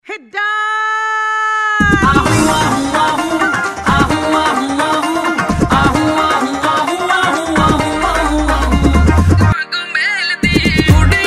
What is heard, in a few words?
new hindi remix